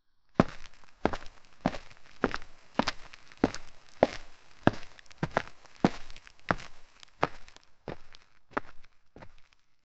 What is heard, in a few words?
single footstep left foot, pavement